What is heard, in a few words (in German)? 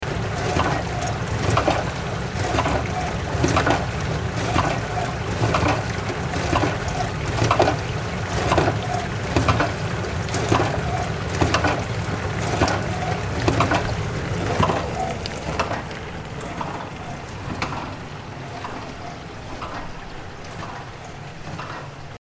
Waschmaschine